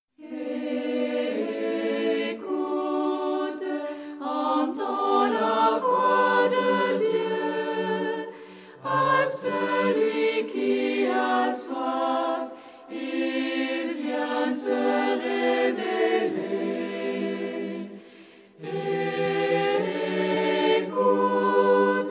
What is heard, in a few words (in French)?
Écoute entends la voix de Dieu